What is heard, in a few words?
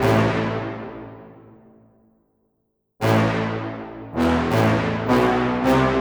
Tubas tacc; туба